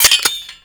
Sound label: Four Barrel Rifle Mech